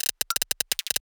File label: Glitch